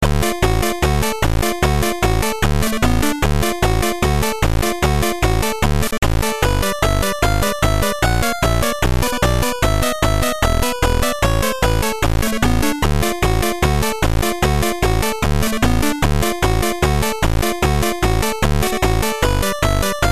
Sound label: Round 3; Bomberman GB